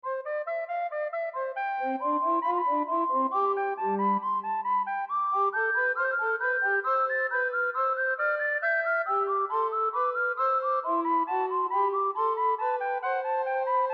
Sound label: 2 part Inventions, recorder; Bach; BWV 772